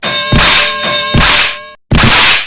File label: break